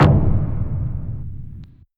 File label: Stomp